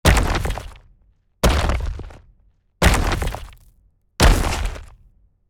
Large beast monster footsteps; fantasy

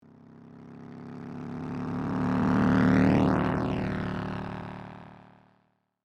Motorbike passing by distant perspective; vehicle

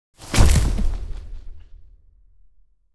Dragon landing ground impact

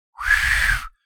Human failed whistle

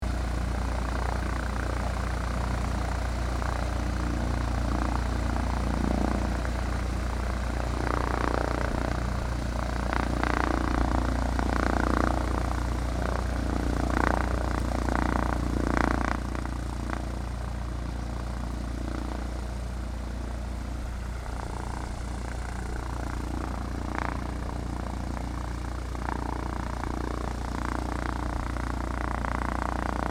Light aircraft idling engine loopable

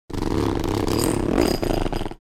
Large hard case suitcase zipper

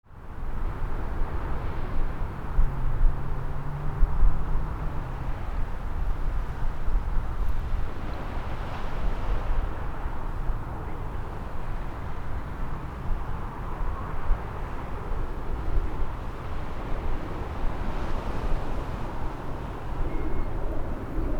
Beach ambience distant beach waves

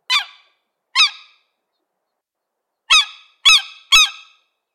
Seagull call bird calling

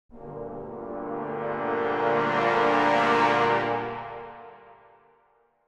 Suspense accent horror tension dramatic

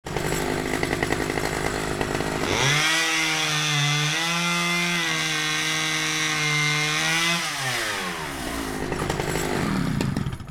Chainsaw revving and cutting branches in a tree

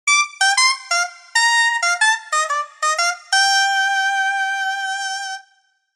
Sitcom accent trumpet jazz

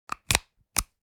Speaker connector adapter plug in; headphones and speakers

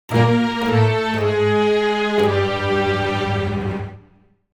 String ensemble descending riff

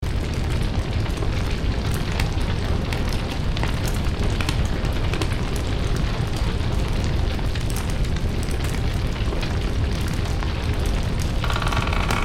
Burning house intense fire raging flames loop

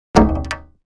Empty plastic container impact single hit

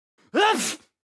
Human male explosive sneeze

